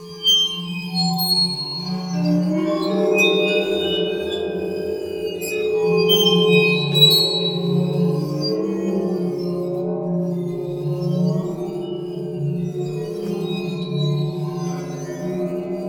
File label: Waterphone Bow; Sonic Foundry (Sony Creative Software) - Ambient Atmospheres & Rhythms; Ambient-Metals